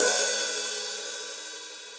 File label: Hihat Open Classic Muffle; Drum Hits; Standard Hits; Ableton; Factory Packs; Session Drums Studio